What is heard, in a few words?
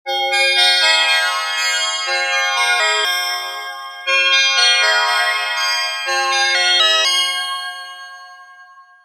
disharmonic addition